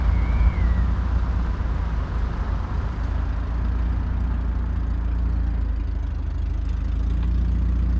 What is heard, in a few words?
Sen416 with outdoor kit no deadcat, Windnoise